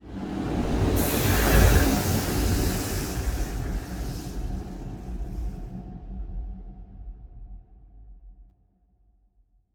ship juggernaut move; Stellaris; machineage; ships

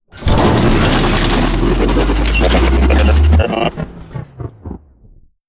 event robo; Stellaris; synthetic dawn

